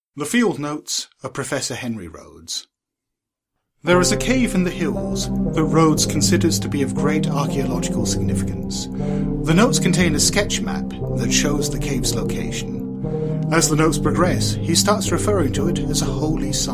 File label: music; BWC Handouts; Roades Field Notes